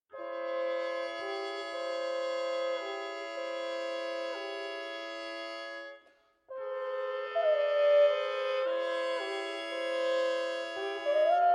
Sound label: largo, horn; trumpets; fanfanfaren vii; Andy Kozar